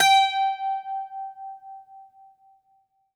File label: Greek Bazouki, plucked strings